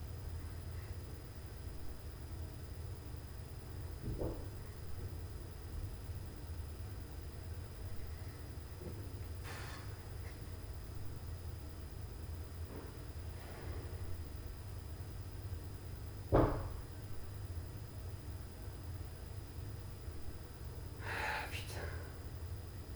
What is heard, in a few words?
Heating + WEIRD POC NOISE + COMMENTS, Night Noise